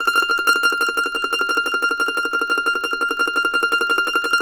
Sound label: MANDO TREM F6; Sampleheads; Whole Lotta Country 1 & 2